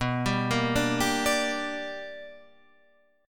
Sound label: B Minor Major 7th Sharp 5th Guitar Standard; arpeggiated; JGuitar